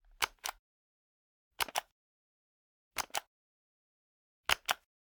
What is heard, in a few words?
Generic Button Plastic Small